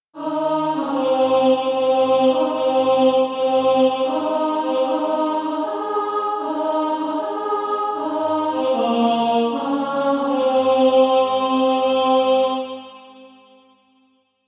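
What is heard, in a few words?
Bugle Call alto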